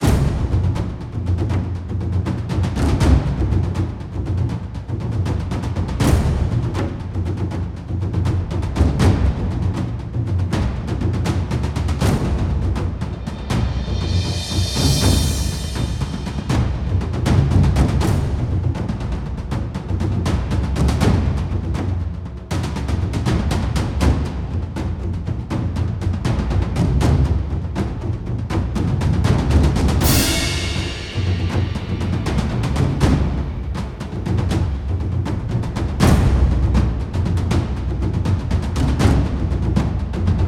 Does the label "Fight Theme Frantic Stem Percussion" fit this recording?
Yes